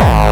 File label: BD Distortion, Bassdrums; Vengeance Samples